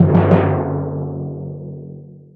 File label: flag lost; Tribes2; t2-mapper